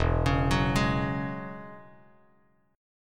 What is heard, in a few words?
E 6th Add 9th E down Bass Standard; E6add9 arpeggiated; JGuitar